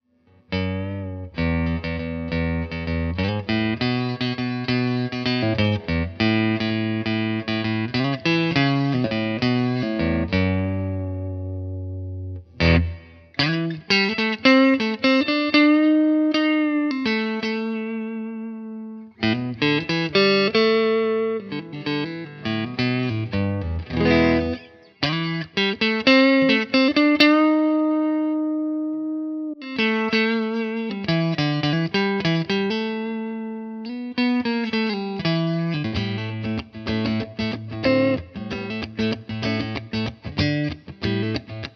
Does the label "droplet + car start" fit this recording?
No